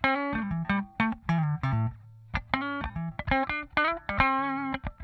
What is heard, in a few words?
TRICKY, Funk Guitar; 90 s Sample CDs; Zero G